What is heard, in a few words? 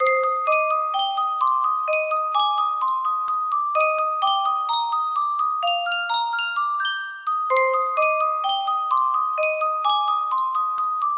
yunsheng music box, music box melody; ffxiv heavensward